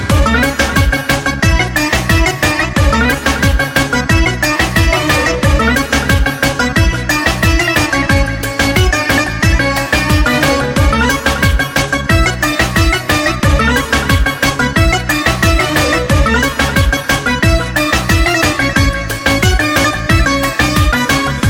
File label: Balkan Turbo Folk